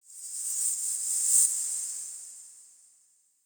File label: Bell Jingle High E; Ambience; Champion Crypt